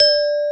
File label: xylo D